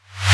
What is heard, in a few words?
808 snr